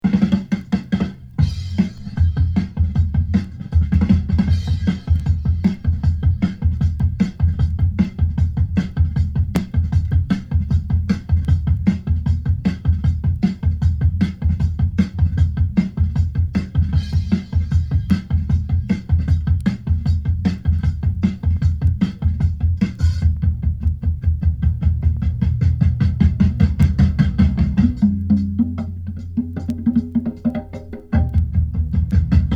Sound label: polluted drums